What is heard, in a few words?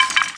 wood deb, impact debris wood; SiN